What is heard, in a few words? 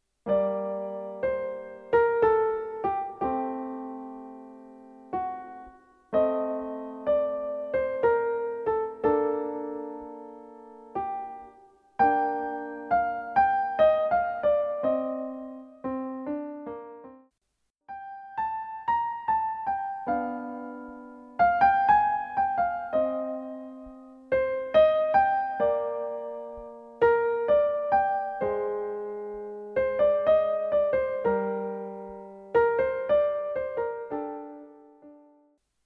Adagio for Strings in G Minor; Albinoni